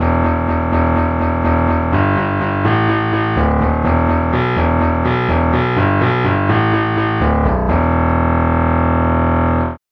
baritone sax bass

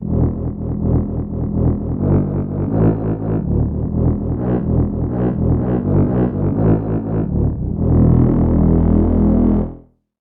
french horns bass

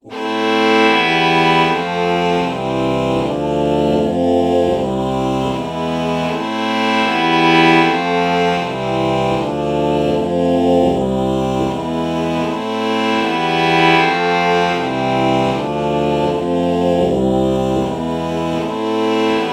oh violin chord morph

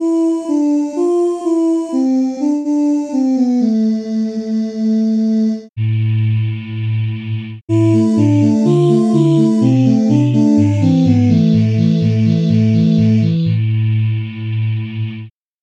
space voice

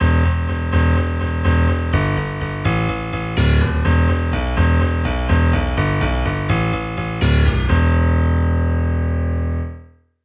bright yamaha grand bass; SpectMorph Evaluation #4

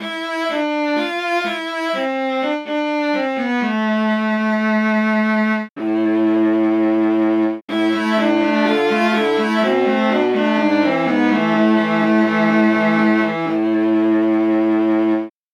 viola

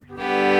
alto flute violin morph

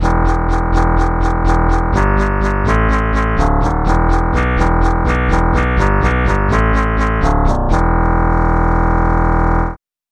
muted trumpet bass

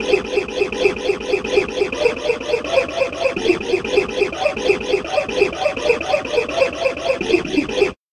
fret noise bass

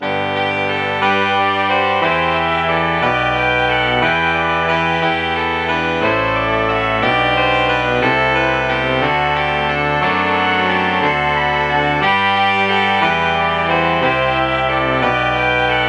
soprano sax

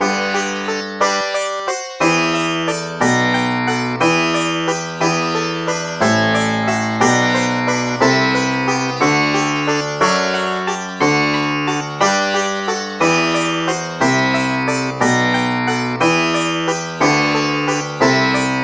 sitar

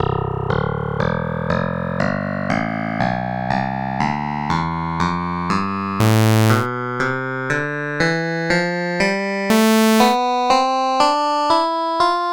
charang; tonleiter

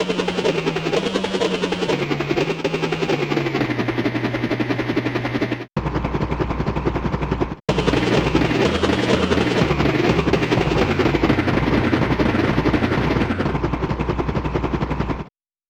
helicopter

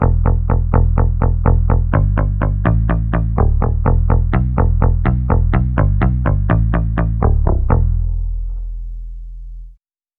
palm muted guitar bass samples

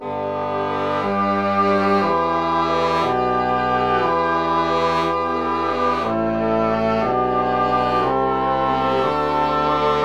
english horn

